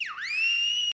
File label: whistle